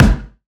Acoustic Drums - Kick; Heavy Acoustic Drums; Antidote Advent

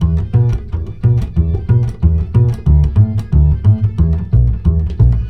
BASS, Balkanish Traditions

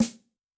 Dry, Yamaha DTXpress-II snare

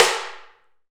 PRC T. DRM, Africa; Roland LCDP14 Africa VOL-2; Afro Menu 1

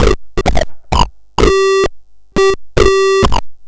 Destroyed Loop, 130BPM; musicradar; bitcrushed; Crushed Loops

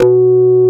Korg CX3, C 1

